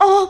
"oh", orgasm; ooh ahh

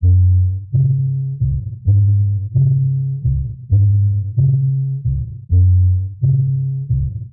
bass funky, Loops

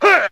"huh" martial arts guy; ooh ahh; heh huh

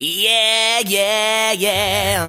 yeah yeah yeah yeah sung; ooh ahh; voice

